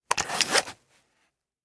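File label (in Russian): zvuki politseiskoi dubinki, police baton sounds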